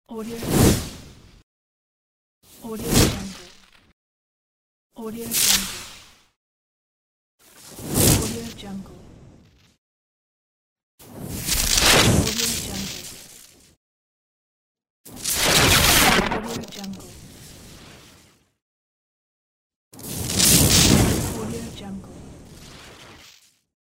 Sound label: frozen whoosh